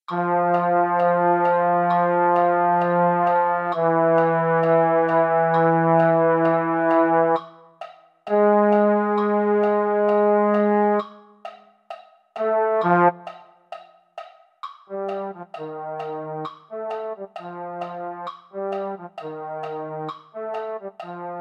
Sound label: baritone; concert corps